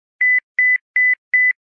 Low Beeps; LG; VX8575 Chocolate Touch

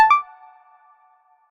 Food Bell, notifications; Lenovo; ZUI 13

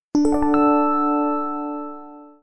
Glow, notifications; TCL; 20 5G

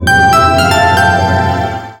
Power Off; SK Telecom

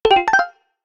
Alien, notifications; Coolpad; Note-8

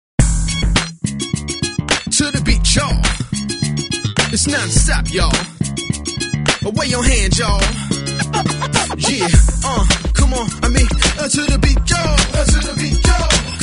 Rap Boy; Alcatel OT V555 Vodafone 555

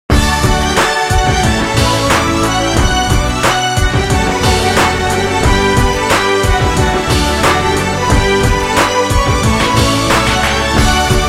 Canon; Sanyo SCP-6780-Innuendo

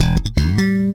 camstart; LG; KU3800 Bubble Pop

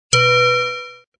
Chime Open; Kyocera; KX2 Koi; flip

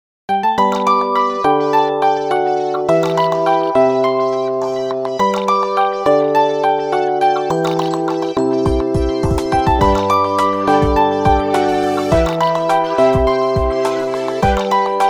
Soothing, ringtones; Tinno; ATT Radiant Core

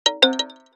Wooden Percussion; Motorola; Atrix 2

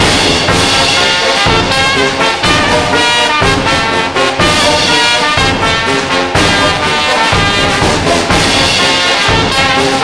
Marching Band, ringtones; SKY IM-A890S-VEGA-Secret-Note

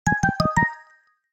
Bounce, notifications; Motorola; Edge 70 Max China

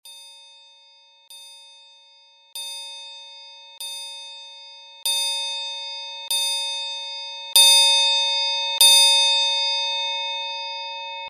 aluminium wake-up alarm; Samsung SGH-F310 Serenata; Alarms

